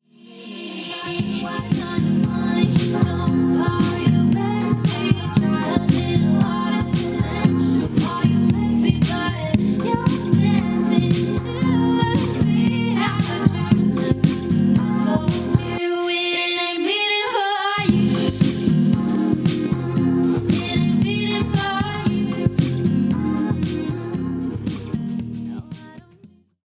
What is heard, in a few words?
BEATING FOR YOU, Country/Folk/Acoustic